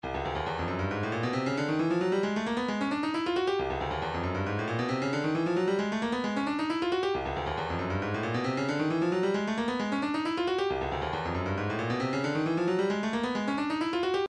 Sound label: shaker, midi